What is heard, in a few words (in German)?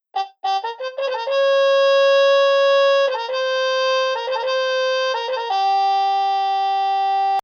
Grammophon Trumpet